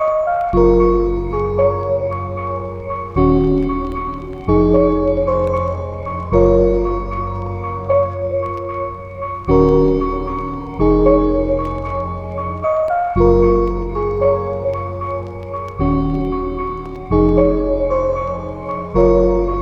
76 BPM A# Min, Lofi Melodies; Imagination; Cymatics; Lofi Starter Pack

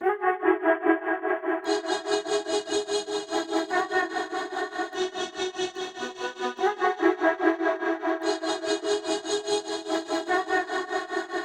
146 BPM C# Min Vocal; Loop Stems; Cymatics; Message

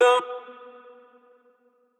Wet One Shot - B, Tonal Vocal; Cymatics; Euphoria Vocal Sample Pack

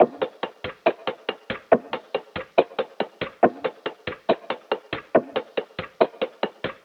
Clockwork Percussion Loop - 140 BPM; trap; Drum Loops; Cymatics; Trap Starter Pack

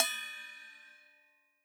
Crash China; Percussion; Cymatics - Infinity Beta Pack 2.0; Zeus Percussion Collection

